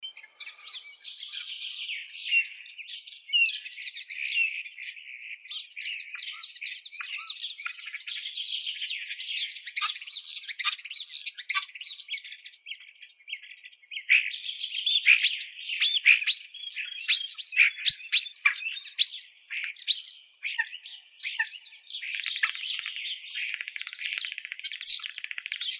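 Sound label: common starling sturnus vulgaris; skvorets obiknovennii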